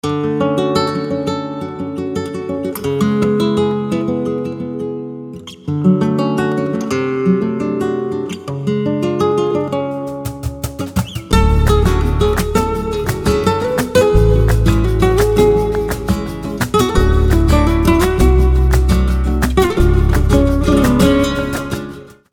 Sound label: Flamenco Guitars